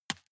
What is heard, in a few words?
juggle catch medium